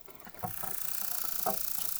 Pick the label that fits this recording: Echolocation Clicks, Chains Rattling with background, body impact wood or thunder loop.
Echolocation Clicks